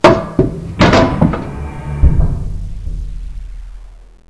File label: big stop